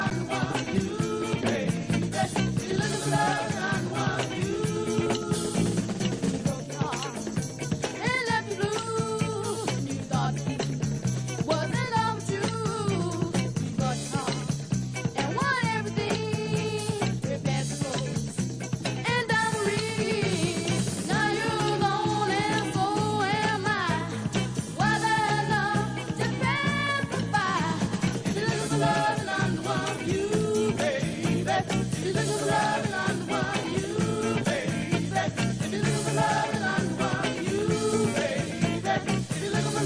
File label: TRIADS